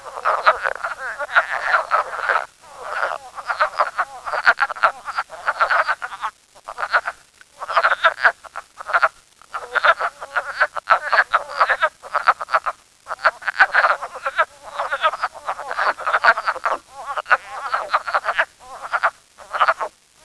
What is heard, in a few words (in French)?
grenouille des bois